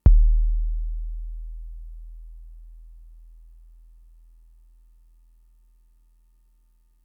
kick, Fricke MFB-522

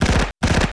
machine gun; Total Control - Action Cutz

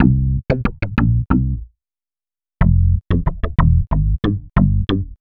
Processed Slap Bass, Electric Bass

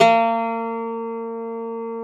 cister A#3; SoundBytes; Early Patches

